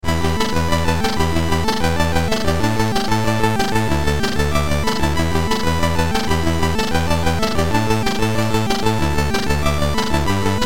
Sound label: C64; last ninja